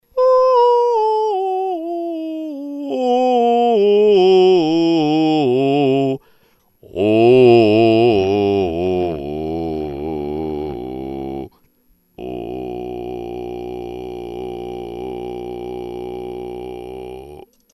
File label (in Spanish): mi rango vocal